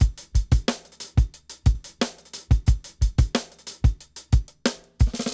Temporary Bliss 90 bpm, drums; Basement drums vol 1

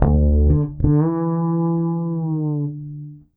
BASS, 180 BPM, Drum & Bass; Ueberschall; Drum & Bass Resonance

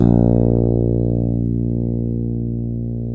FRETLESS, BB

